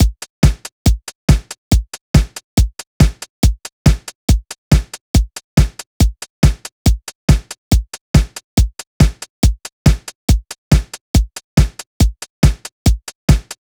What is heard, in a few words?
Electro loop; Reference Beat